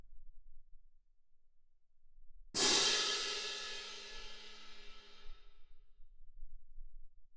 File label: Twin R Perc